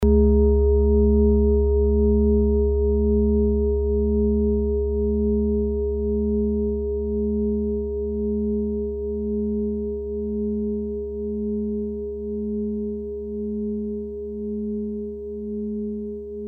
fuss klangschale, Planetentonschale: Eros-Ton, Fuß-Reflexzonen Klangschale; Fußschale; bis Schuhgröße 41, maximal für Schuhgröße 42

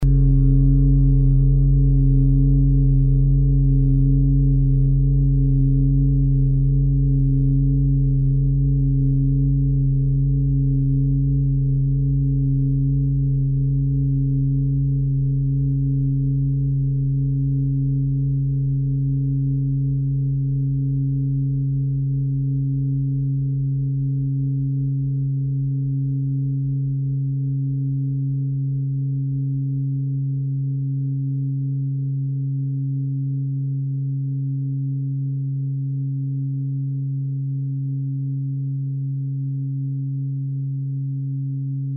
fuss klangschale

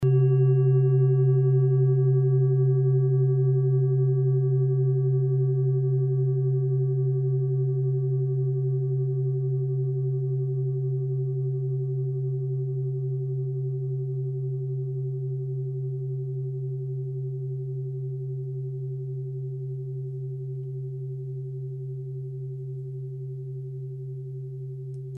Klangschale Tibet, Planetentonschale Klangschaletyp Tibet

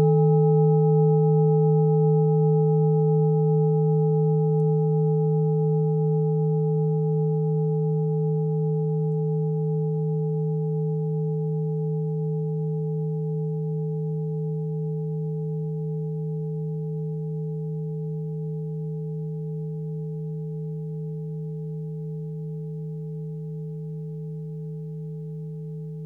klangschale orissa

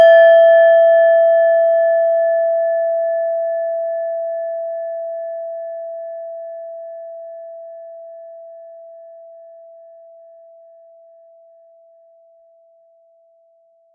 klangschale nepal, Klangschalen Nepal; Hörprobe der Klangschale

